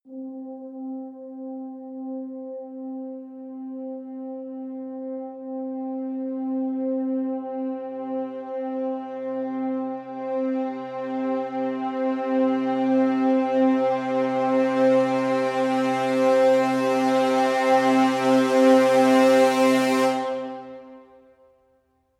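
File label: crescendo brass swell hi C; brand x music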